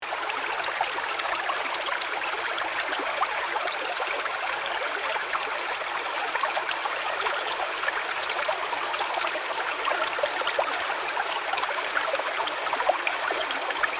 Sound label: river ambience; River & Waterfall